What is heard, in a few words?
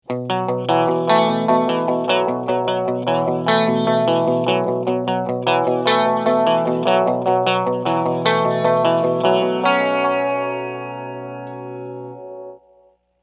Clean Preset